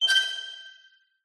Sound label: Objects Items Interactions Menus; PMSFX